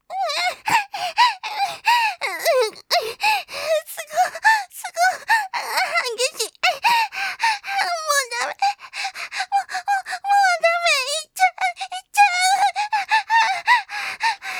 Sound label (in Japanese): 喘ぎ さらにふやふや、激しいよぉ。。。; すすきちゃん（狐耳のJC現代系退魔師）